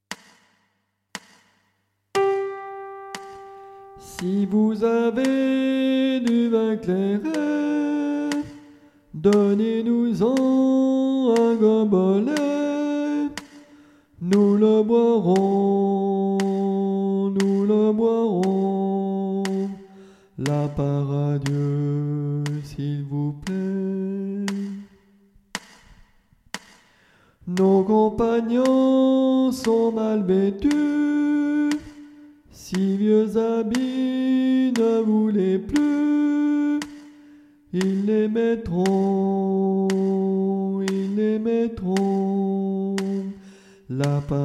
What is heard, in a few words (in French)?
sop, chants